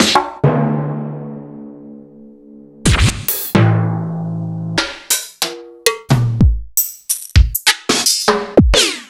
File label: EMU Proteus-2000; Sliced